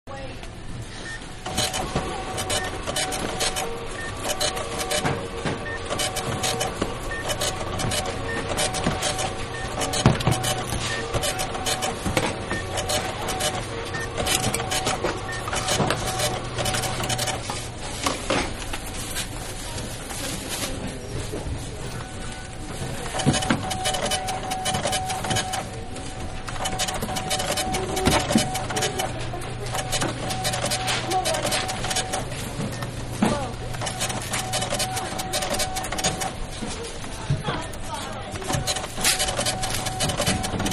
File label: Supermarket Big Checkout Area; Stores and Malls (Sound Effects:Backgrounds); Manhattan Production Music